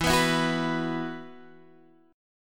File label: E Suspended 2nd, Guitar Half Step Down